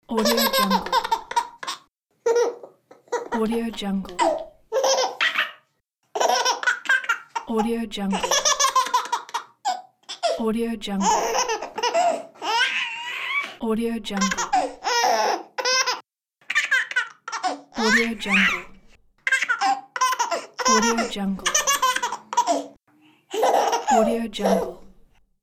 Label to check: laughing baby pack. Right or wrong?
Right